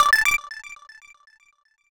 graphics application notification

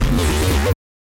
stylized glitch sound; soundscrate

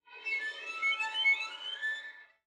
Violin Slide Up; SoundsCrate

